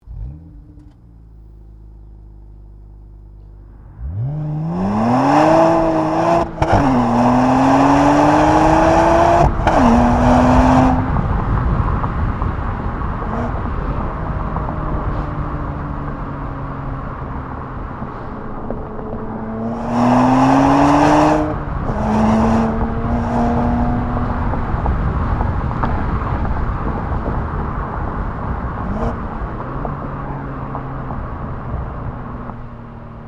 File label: BMW 135i